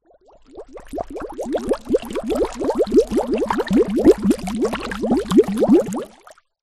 water bubbles from small plastic submerged watering can